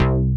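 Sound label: ARP2600 bass C3; Sonic Reality; Vintage Timetraveler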